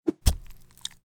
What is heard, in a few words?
Tactics Knife